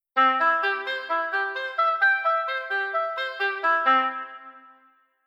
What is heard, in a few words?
arp